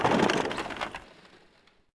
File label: War Chariot End; Civ4 warlords